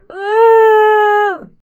female

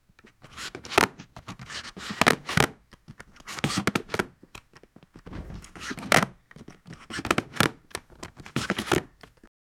satisfying demonic card g

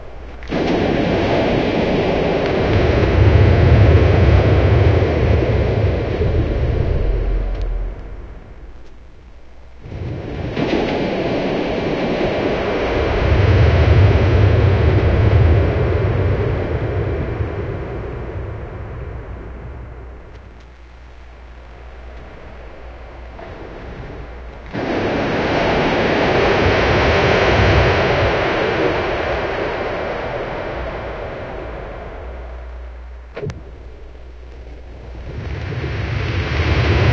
heavy echoing thuds, desert

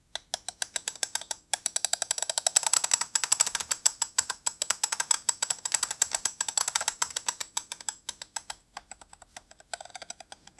robotic movement with, robotics

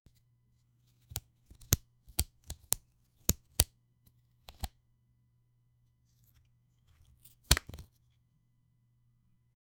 sound of separating a car, card

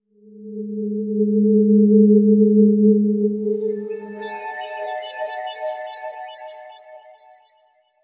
medieval ui select ui opt

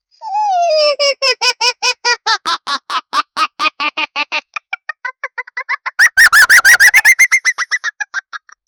cartoon kid sly laugh

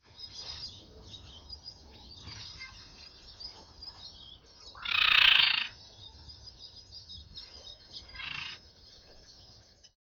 a parrot is flying; bird

